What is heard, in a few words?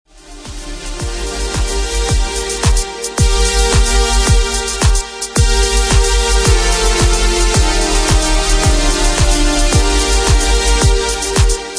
house dub